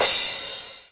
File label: Crash 909; Drum Machines; Drum Hits; Ableton; Factory Packs